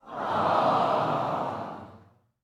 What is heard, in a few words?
Audience sigh; anemoi